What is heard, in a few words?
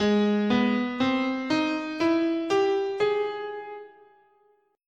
Ab Augmented, scale